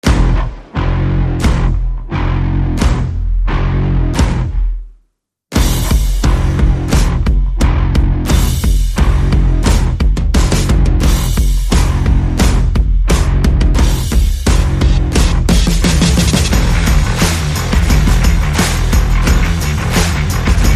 Dusty Rivers, Percussion Bass and Effects

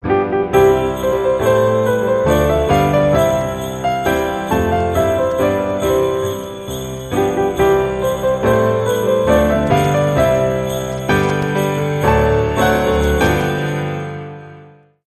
Twelve Days of Christmas

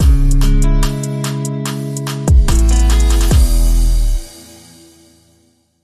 Bumper

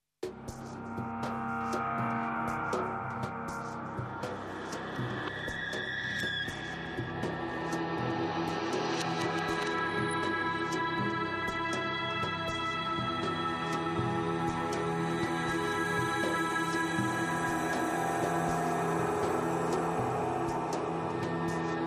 Drones Only

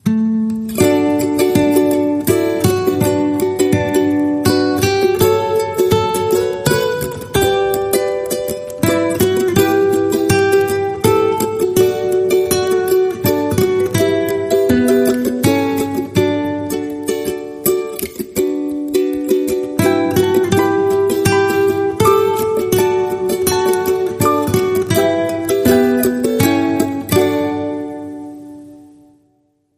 Ukulele Guitar

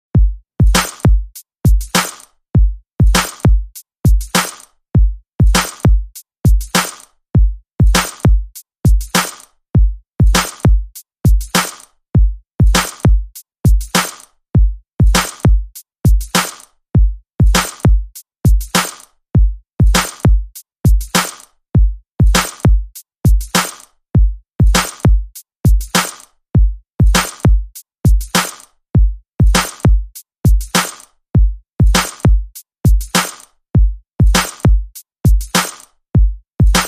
Thwack Beat

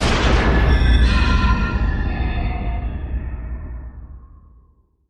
Hollow Boomer Impact And Metallic Tail

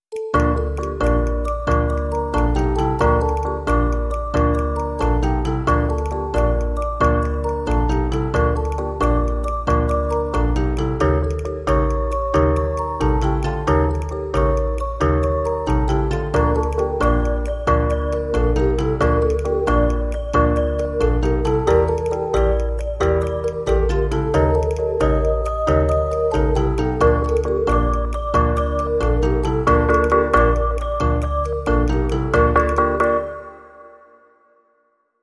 Naive March No Synth

